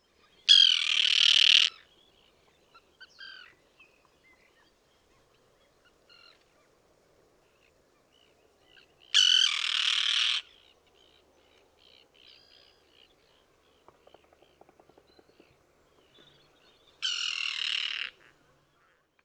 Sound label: Common Tern Sterna hirundo